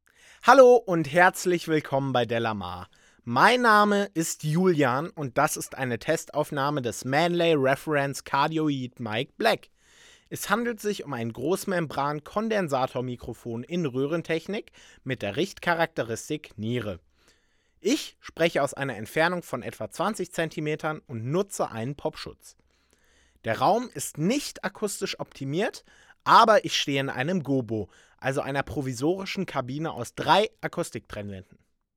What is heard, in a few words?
manley reference cardioid vocal neumann u 87 ai, Großmembran-Kondensator mit Vollröhrentechnik; manley reference cardioid test